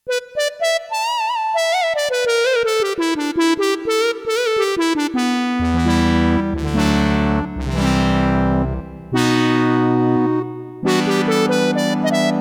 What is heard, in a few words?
SQUARE, Roland JX-1; Factory presets